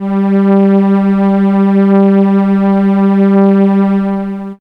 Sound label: Cello G3, ARP Solina; musicradar-synth-samples